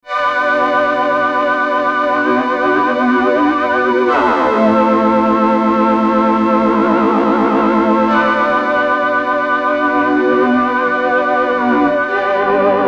Moog MF Flange Manuelle Modulationen

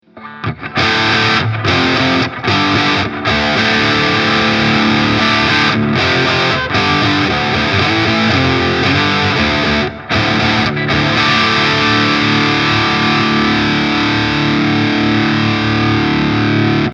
FGN J Standard Iliad 664 Steg Gain Drop D

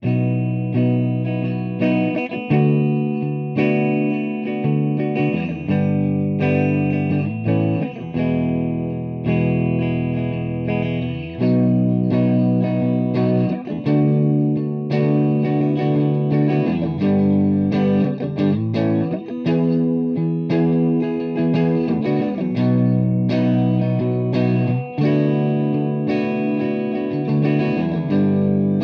Verzerrer Pedale Neutral; Walrus Audio Ages

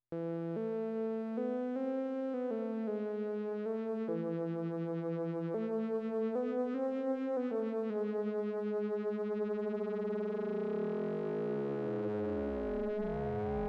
Dreiecksoszillator mit hohem manuellen Frequenzshifting

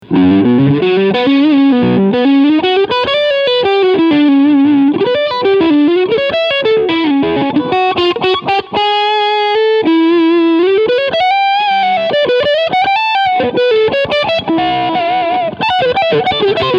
Crunch beide PUs

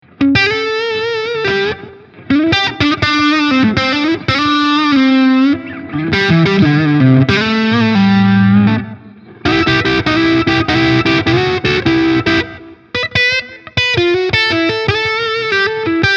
Hals HB verzerrt

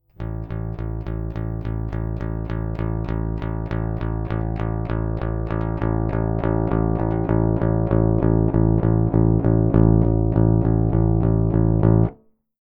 Bass Rickenbacker DI Signal Hi Mid Boost Stufenlos nach unten

